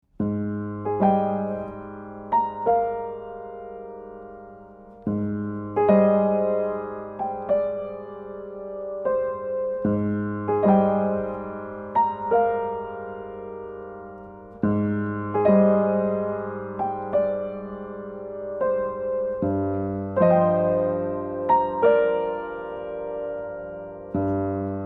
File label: Piano Moon; wattam original soundtrack 2020